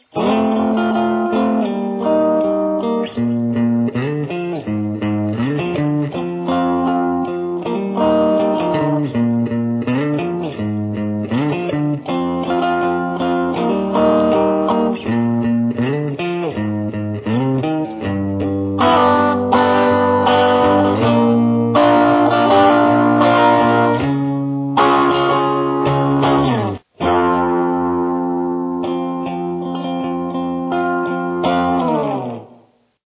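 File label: solo rhythm, guitar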